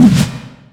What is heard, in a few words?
whoosh, large blade; weapons; dota